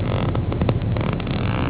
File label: creaky, doors